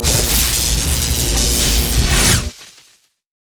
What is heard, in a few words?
lightning rush loop; LoL; kennen